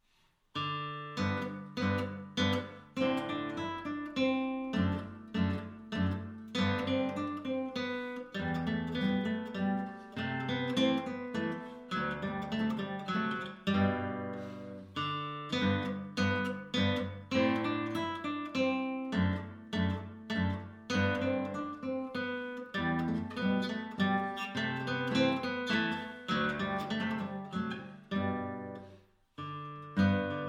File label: Deutsch Tanz duo